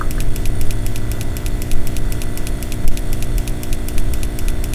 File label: Positive High Voltage